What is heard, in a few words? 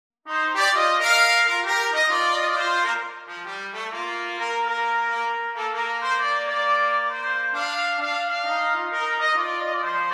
jazz Trumpet Duet; hark the herald angels swing